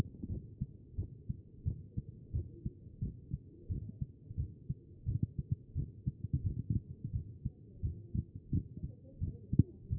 chest; B MODE

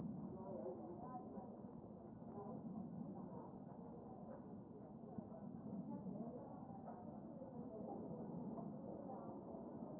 P CHEST

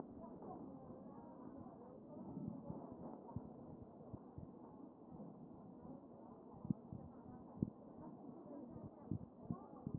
A CHEST

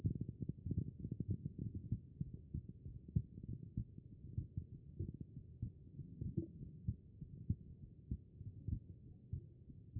HEART B MODE

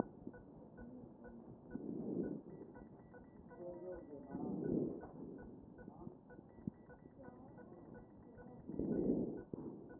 CHEST pos1 D MODE

